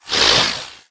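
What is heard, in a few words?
Drone Movement Turn; Titanfall 2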